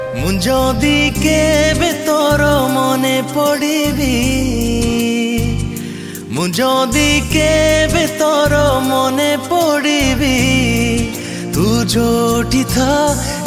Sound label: New Odia Sad Song